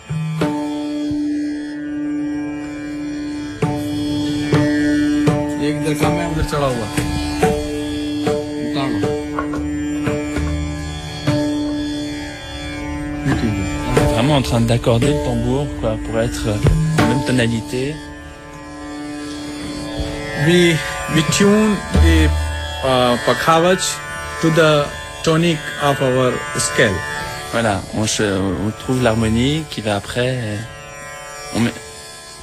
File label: Dhrupad tambour; Ramakant Gundecha